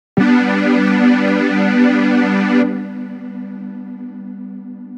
Techno Pad, jup8; That Pad

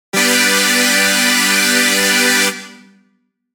Analog String; jup8; Analog String Edition

